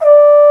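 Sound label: Trombone p D4; Multisamples; Digital Sound Factory Virtuoso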